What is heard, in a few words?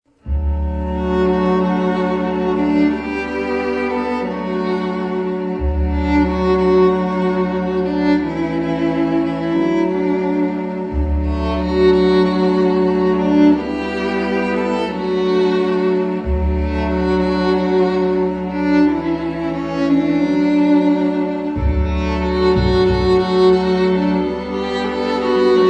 String Quartet